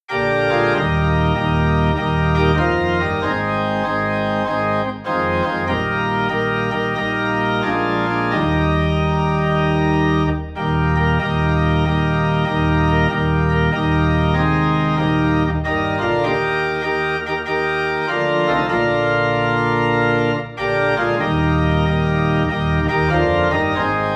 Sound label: Organ Low in E Flat; Are You Washed In the Blood